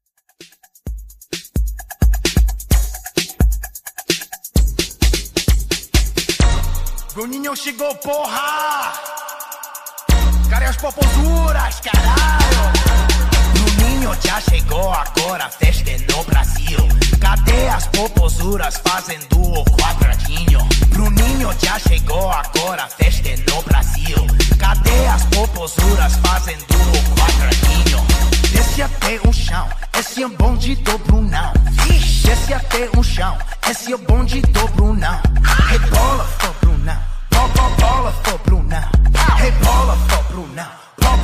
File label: 130 BPM